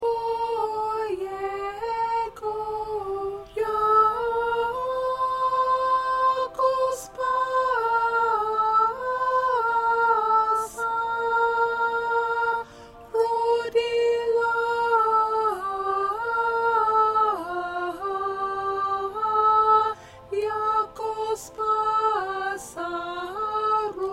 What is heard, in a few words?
Alto 1; sacred classical pieces; part preview